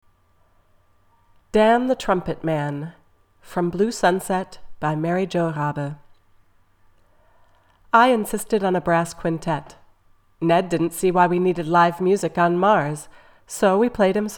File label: Trumpet